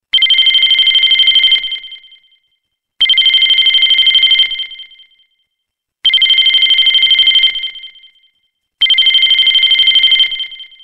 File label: Old Telephone Ringtone; ringtones